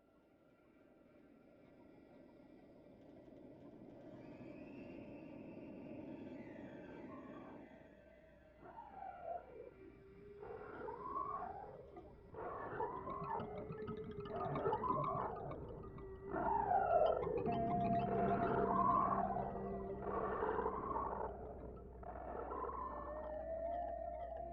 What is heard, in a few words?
Whales